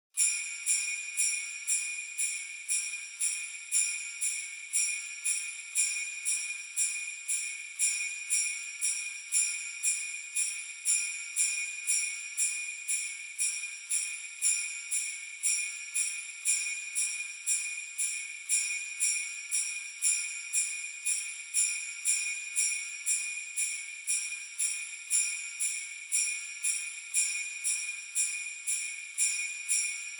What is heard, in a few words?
Sleigh Bells Reverb Knock Gentle